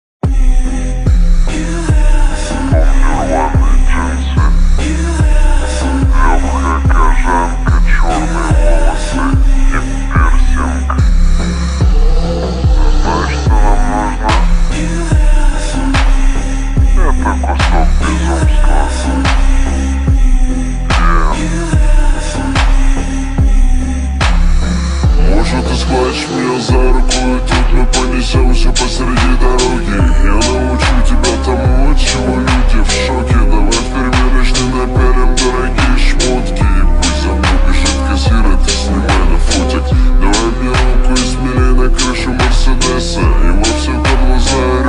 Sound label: super slowed x reverb